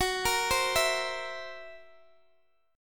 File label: Fsharp 7th Flat 5th down Guitar Open D